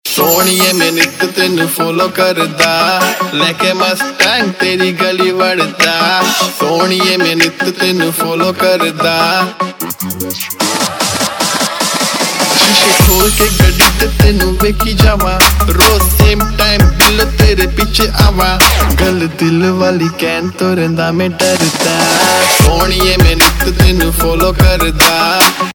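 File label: Ringtone, Punjabi Pop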